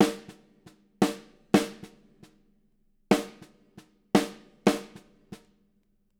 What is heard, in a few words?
154 SNR, NTPASS, Living Drums; Sampleheads; Peter Erskine